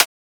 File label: Transient; FLPRO